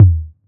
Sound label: Tom